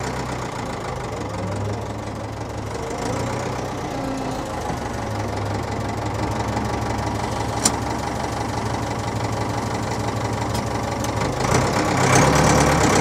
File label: Truck Diesel Idles